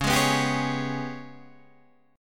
G sharp Minor Major 7th Double Flat 5th, chord

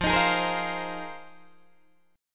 F Minor 11th, Guitar Open D

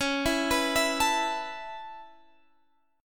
B 7th Suspended 2nd Suspended 4th C sharp

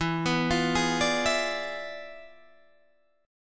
E 7th Flat 9th Guitar Standard, chord; E7b9; arpeggiated

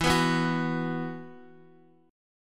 E Suspended 2nd, Esus2 chord, Guitar; strummed; x,x,4,4,4,2

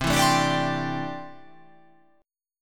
C 6th Add 9th C down

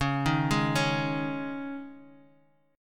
C sharp Suspended 2nd C sharp, chord; C#sus2; arpeggiated; JGuitar

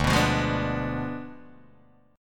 D Diminished 7th D down Guitar Open D

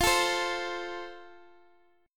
F Major, Guitar DADGAD

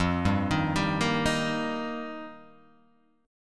F 7th Suspended 2nd Suspended 4th F

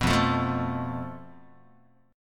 F Major Flat 5th Guitar, chord; strummed

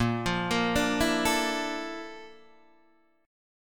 Bb Major Sharp 11th Bb down Guitar DADGAD, chord

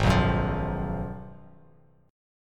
Ab Minor 13th, Bass Standard; Abm13 strummed; JGuitar

